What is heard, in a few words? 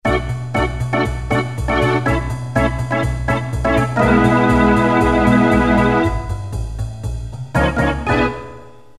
Organ